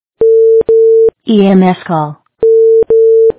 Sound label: call